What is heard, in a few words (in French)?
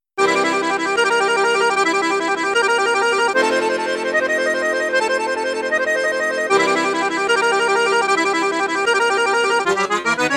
registre basson flute juste flute haute piccolo, accordeon; mengascini f11